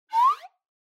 Slide Whistle Overtone Up

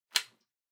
Power Strip Adaptor Hand Hard Unplug

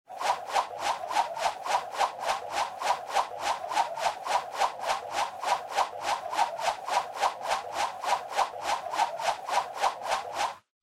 Motion Swish Low Long

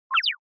Motion Pop

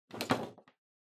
Power Strip Pick Up

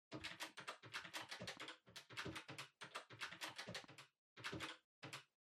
Calculator Far Pattern

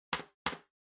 Trackpad Click Far L Hard Double

